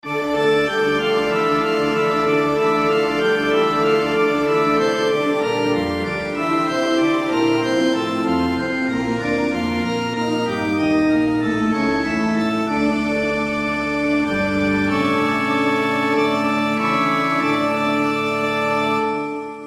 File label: Tone; Castlevania